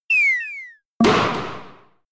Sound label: Fling; attack moves; Pokemon; Gen 5; Blk Wht Blk2 Wht2